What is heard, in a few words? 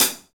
Pop Closed HiHat